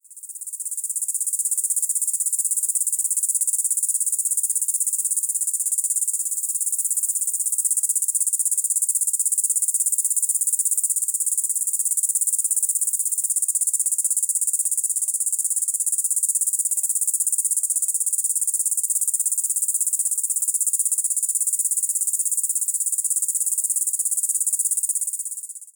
probable Diceroprocta bequaerti, cicadas songs; US; TX; clean

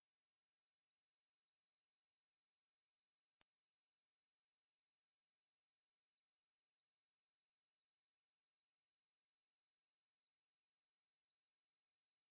Okanagana vanduzeei, cicadas songs; US

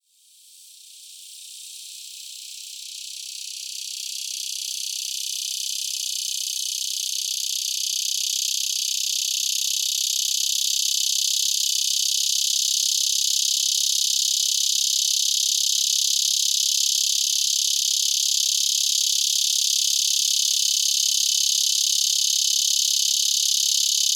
Neotibicen dorsatus one phrase, cicadas songs

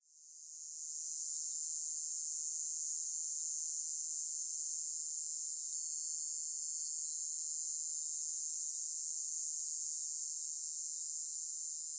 Diceroprocta semicincta, cicadas, filtered; US; AZ

